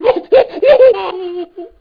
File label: hu hu huhuhuu